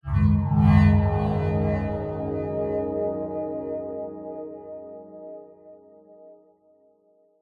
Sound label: multimedia logo science fiction style warm swell